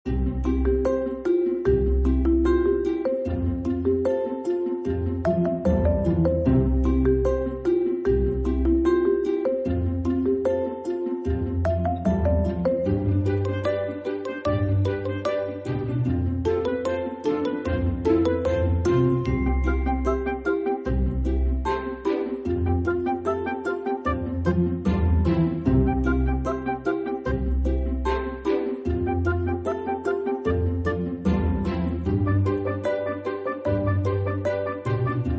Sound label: main background track